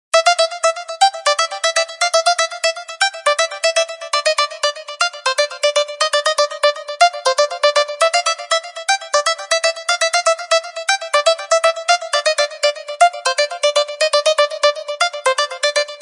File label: Sonar, ringtones; Okapia; Spark